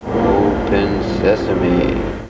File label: open